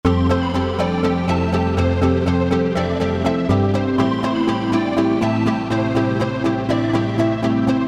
Riff

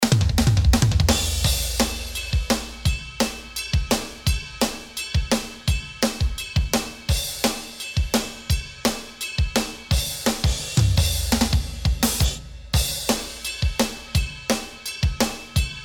drums before

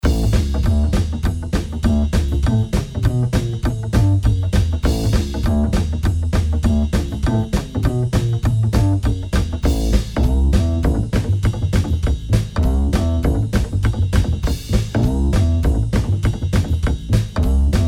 Rockabilly

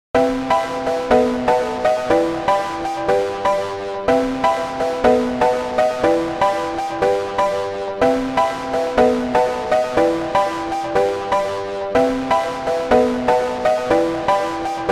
Driven Keys, Session Player; Logic Pro 11; Quick Sampler; 歪み

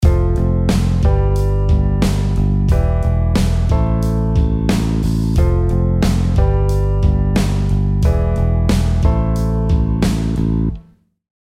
chord

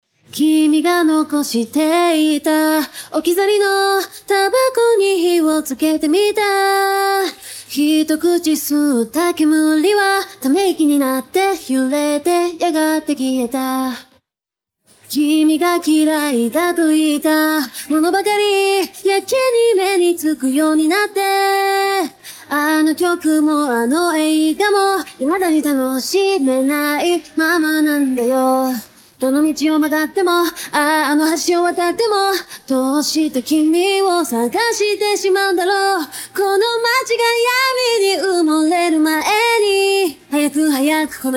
vocals

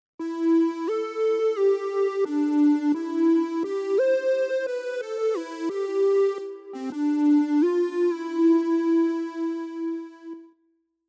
melody for tetrad

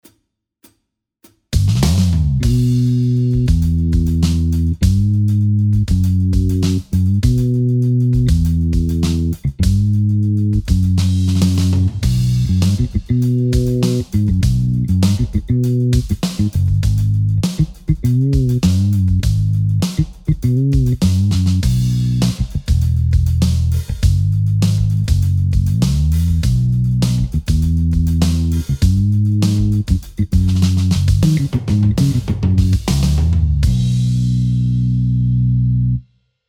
Fretless

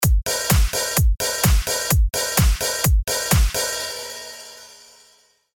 hat

